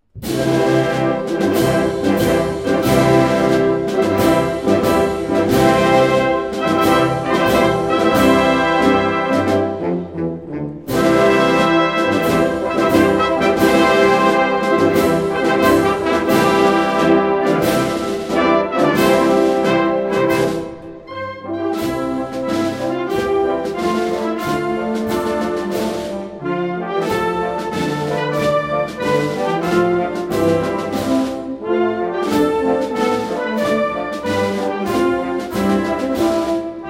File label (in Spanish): himno musica